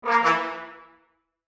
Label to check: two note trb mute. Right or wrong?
Right